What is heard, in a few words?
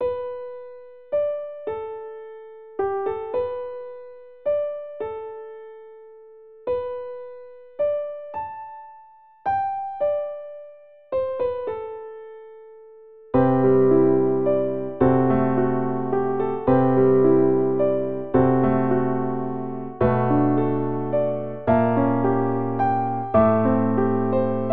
Piano; The Legend of Zelda Ocarina of Time